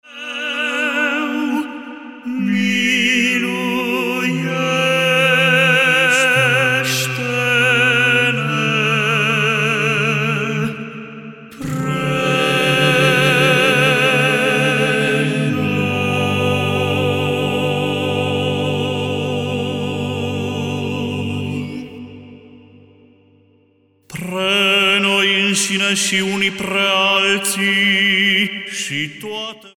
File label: Voice Quartet, Ectenia Mare; florilegiu